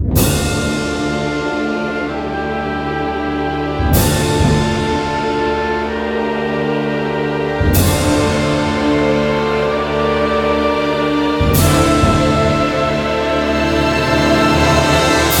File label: Boss Battle Intro